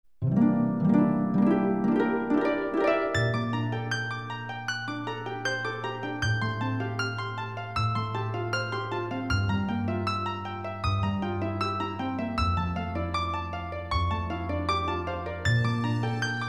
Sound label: Harp Theme; Legend of Zelda: The Ocarina of Time